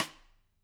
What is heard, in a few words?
K2 room SdSt, drum